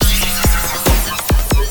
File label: Attack Loop 140 Bpm